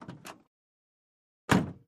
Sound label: Ambulance Door